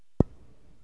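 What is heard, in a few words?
stroke, 9ball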